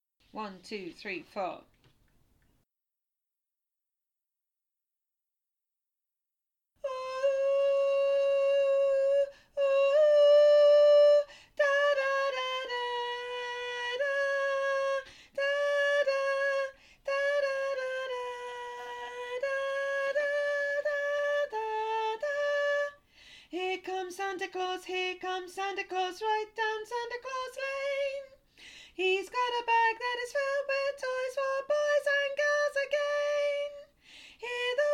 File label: SOPRANO